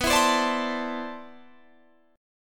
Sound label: C Major 13th B